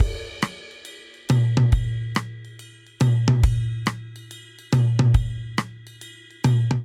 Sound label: Swing Jazz Verse Variant 140 bpm, Drum; MIDI Clips; Ableton; Factory Packs; Drum Booth